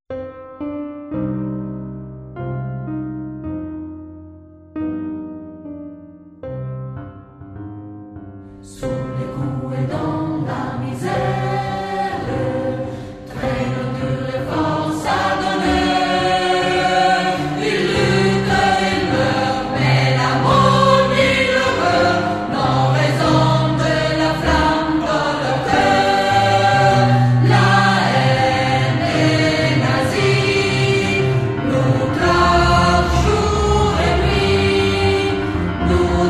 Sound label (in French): Chant de Breendonck